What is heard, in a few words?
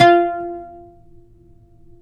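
pluck OUD F; Roland - LA Composer vol. 3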